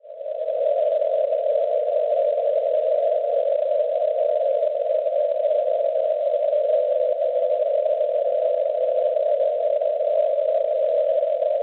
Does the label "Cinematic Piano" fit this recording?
No